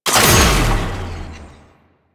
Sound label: Archgun Last Shot; Warframe; Archwing; Weapons